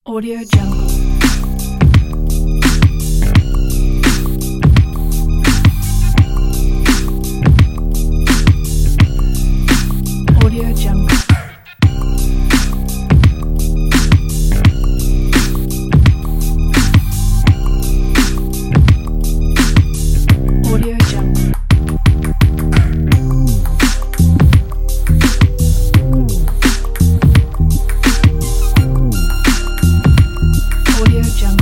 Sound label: ping pong